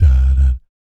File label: "BS DA DAT", vocal jazz fx